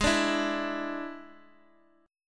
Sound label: D Suspended 2nd Flat 5th G sharp, guitar